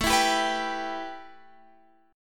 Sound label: A 7th Suspended 2nd A Guitar Standard, chord; strummed